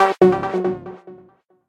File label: gameover